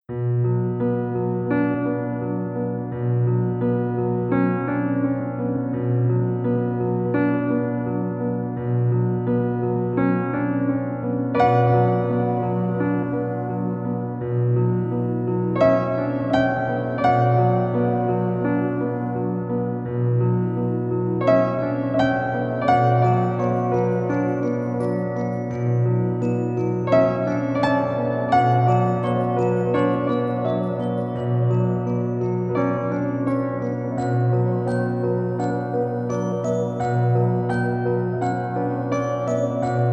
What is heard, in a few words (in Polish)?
pozytywka 432 hz